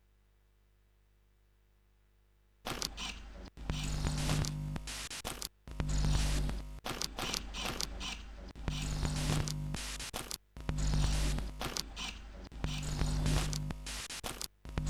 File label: stereo loop